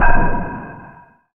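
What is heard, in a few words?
PER PROCU, Procussion, percussion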